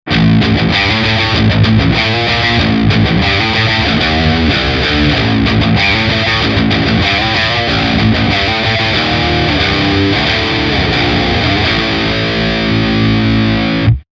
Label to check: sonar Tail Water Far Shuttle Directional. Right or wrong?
Wrong